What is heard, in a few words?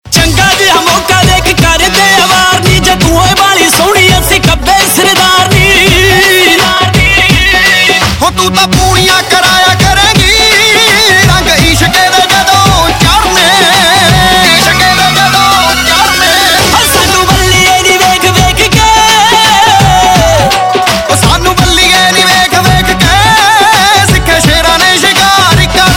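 Ringtone, Punjabi Pop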